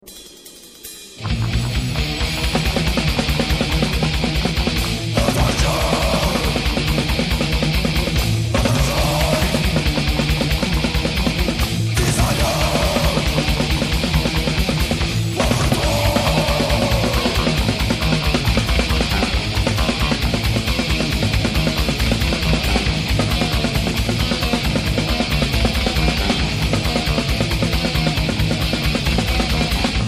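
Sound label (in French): guitare électrique riff; un début de chanson bien bourrin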